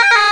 CHANTER G#1; Proteus3

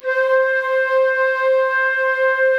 C.FLUTE C 5, cross flute

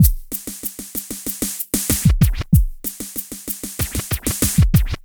Street Tip, R&B Drums; Sonic Foundry (Sony Creative Software)

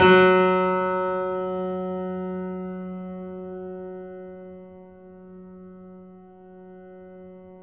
F#, piano sustain

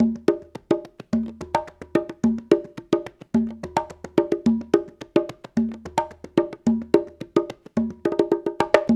Gua Guanco

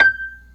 B3, Drawbars

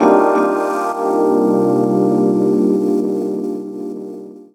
Chords Tones; Equipped Music; Loungin House

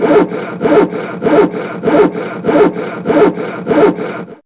Saw, Fairlight CMI IIe

